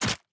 equip, weapons; cstrike; valorant; singurality phantom

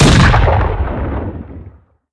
weapons; Valorant; cstrike; bucky

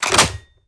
Reload Close; Valorant; shorty

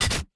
equip, weapons; cstrike; valorant; singurality phantom

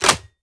Equip Close; Valorant; shorty

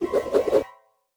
twirl; cstrike; valorant; neofrontier sheriff

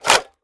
mag out; valorant; araxysoperator

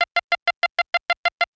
bomb beep; Valorant; raze

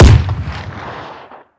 weapons; Valorant; cstrike; ares

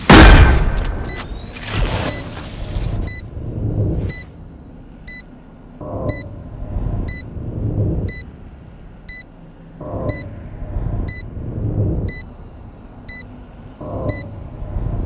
spike bip; Valorant

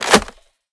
magin, weapons; valorant; araxysoperator

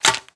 Reload Shells In; Valorant; shorty

